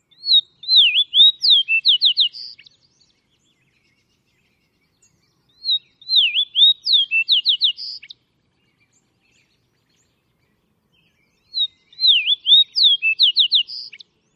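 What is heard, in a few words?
songs red subspecies, Fox Sparrow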